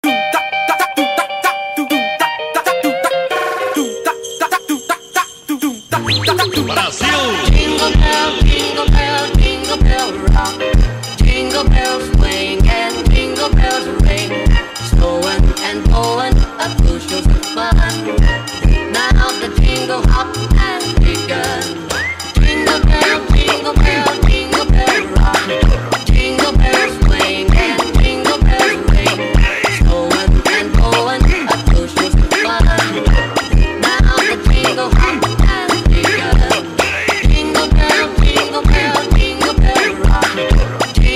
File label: jingle bells funk; latinfolk